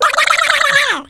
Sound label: BLEHEHEH